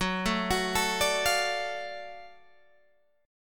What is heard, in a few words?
F sharp Minor Major 7th Sharp 5th F sharp down Guitar Open G